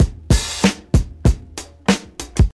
96 bpm hq drum beat b key, Free drum beat - kick tuned to the B note. Loudest frequency: 1342Hz